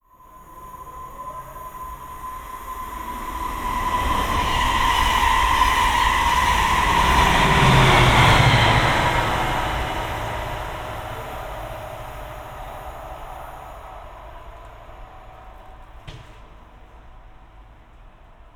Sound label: station train passing; brondbyoster station